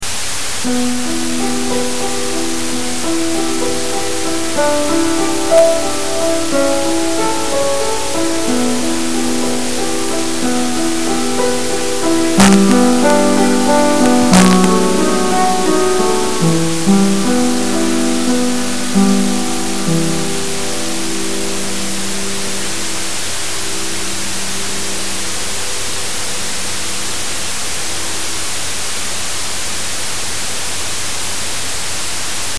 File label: piano dithered